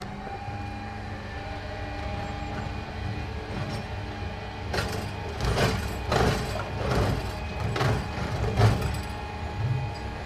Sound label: Garbage Truck